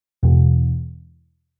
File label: cb pizz